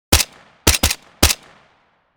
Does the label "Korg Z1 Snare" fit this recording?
No